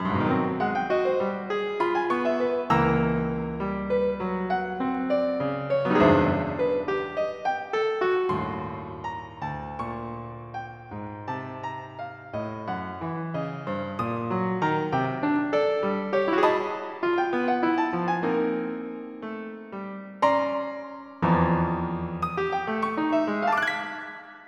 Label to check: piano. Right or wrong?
Right